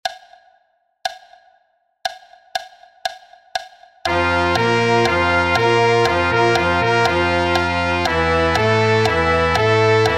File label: 2 note lip flex